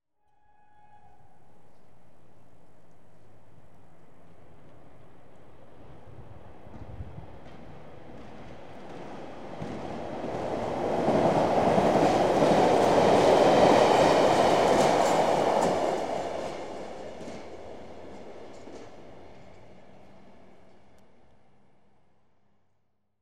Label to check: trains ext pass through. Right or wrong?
Right